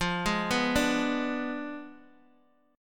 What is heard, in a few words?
F Diminished 7th F down Guitar Open D